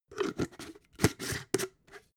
jar glass plastic close

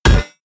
hit metal hammer large

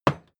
hammer wood hard

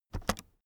keyboard external space press fast soft

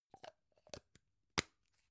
gum plastic box close soft

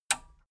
metal button radio release disable

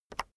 keyboard external enter release soft

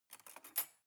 cutlery cake fork drawer grab soft

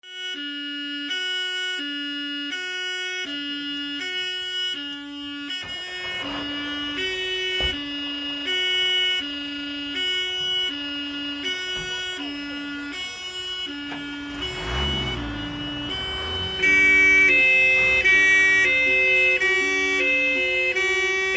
siren

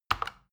plastic button tiny click regular fast

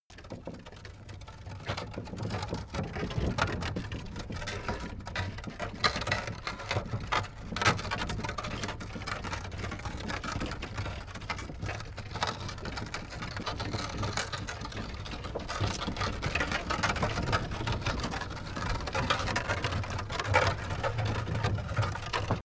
carriage drive concrete scrunch

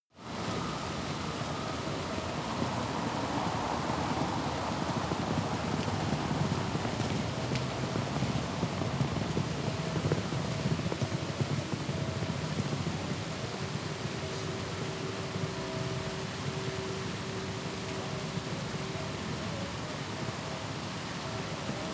rain traffic church bells

